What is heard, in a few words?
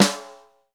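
Snare, Roland; Real Snrs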